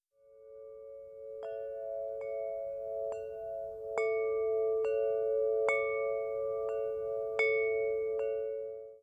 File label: windchimes